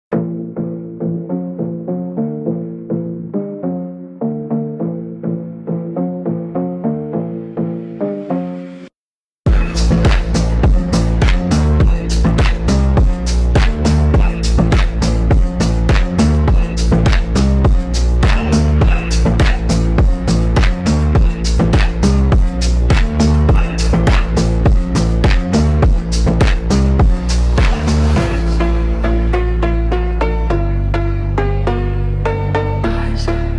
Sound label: slowed phonk